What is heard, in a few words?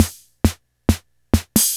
Casio MT-45 Tango